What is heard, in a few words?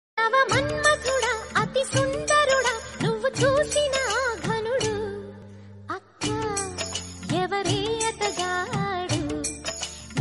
melody ringtone bgm